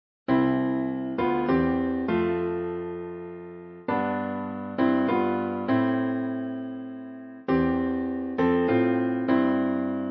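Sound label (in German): Tenorsaxophon; Stille Nacht heilige Nacht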